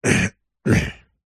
human male 38 years old clear throat

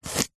food turmeric or ginger raw single slice knife

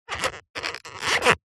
foley plastic squeak creak tighten turn; zapsplat

